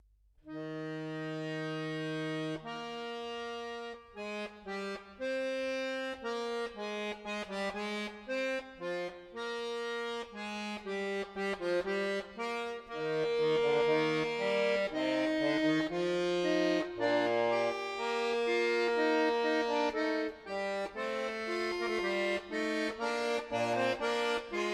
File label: Fugue in E flat major